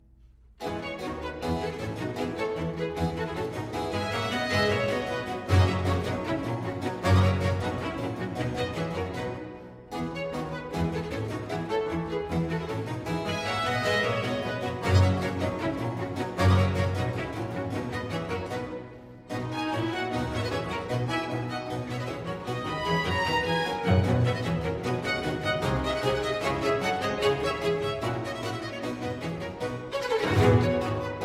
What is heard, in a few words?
Concerto for Strings in F Major, Allegro molto; RV 141; Vivaldi; Concerto Italiano